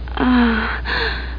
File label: MOAN